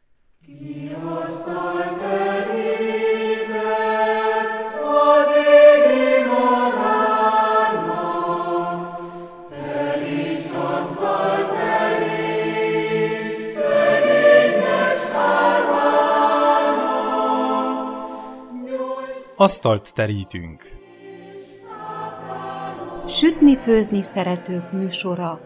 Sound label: Jingle; Asztalt teritunk